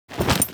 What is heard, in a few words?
Generic Foley; Warframe; Temple